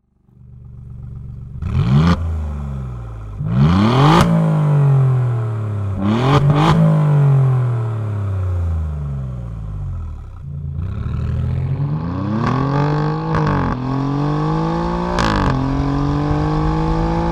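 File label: Alfa Romeo Giulia Quadrifoglio, exhaust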